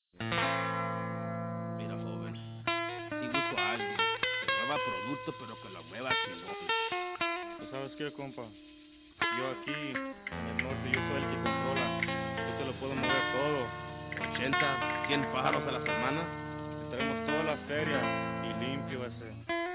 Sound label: music, ambience; cstrike